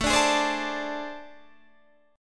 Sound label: A Minor Major 7th Double Flat 5th A, Guitar, DADGAD